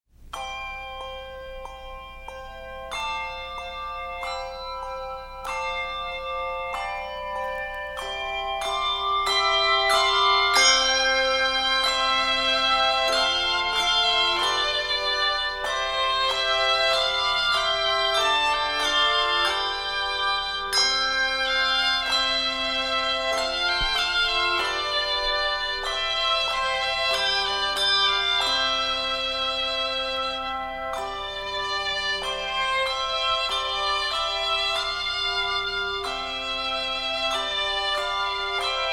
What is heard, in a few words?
Handbells, Handbell Music; Publisher: Jubilate Music; Composer: Bradbury, William B